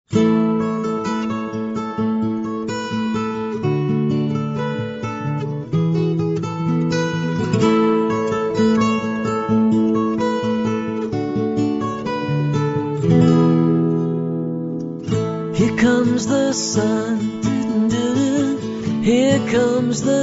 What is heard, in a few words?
acoustic